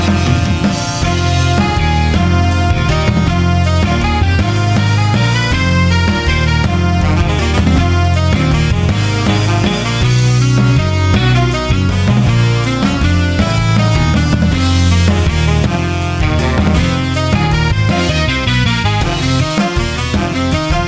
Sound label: chorus